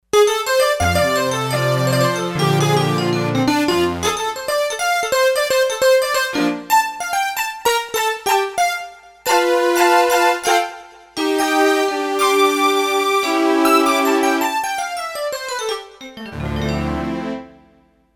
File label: Vibe Strings, Roland D-10; Factory Patches